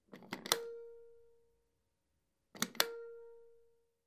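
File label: turning on the light in the bathroom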